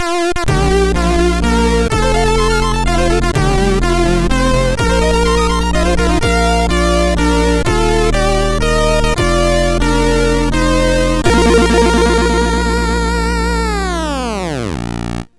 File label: sid MOS6581R4